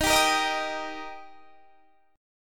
D sharp Major D sharp, chord; D# strummed; 23,22,20,20,20,23

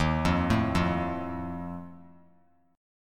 D sharp Suspended 2nd Flat 5th, chord; arpeggiated; JGuitar

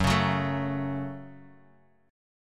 Gb Minor Gb, down, Guitar, Open D

